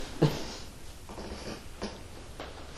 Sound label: drum soldier room